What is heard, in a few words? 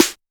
snare